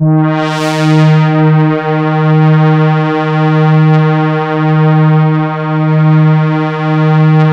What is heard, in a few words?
MONO PAD; PADS